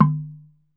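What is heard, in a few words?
TUNG LO, Tongue Drums, Pitched Percussion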